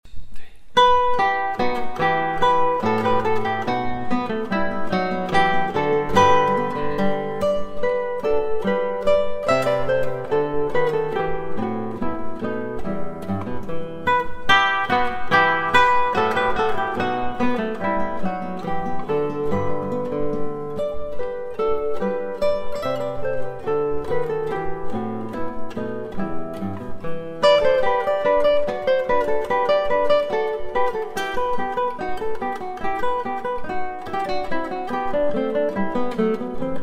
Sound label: bourree